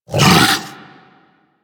Kubrow Vocal Tranq Dart Grunt; Warframe; Hunting Critters; Orokin Kubrow

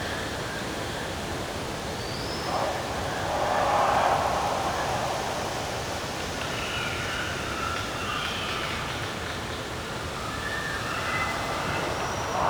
Night Forest Bed

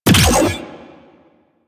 Detron Power Layer C; Warframe; Weapons; Corpus; Lich

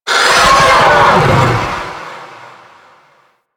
Sentient Vocal Death, Enemies; Warframe; Sentients; Ortholyst

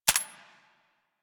Rifle Mech B; Sundial

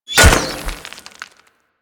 Kunai Impact Dirt; Warframe; Hit Effects

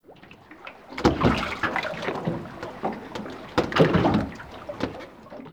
Water Lap Creak Under Dock Slow Deep; Water Laps

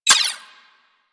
Primary Mech, Weapons; Sapient

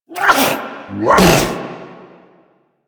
Pet Sneeze; Enemies; Warframe; Catbrow; PetShip

